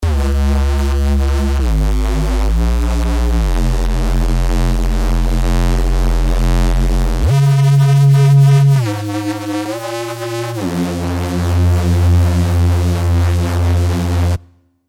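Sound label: Bass Reese; Goofy Ahh; SERUM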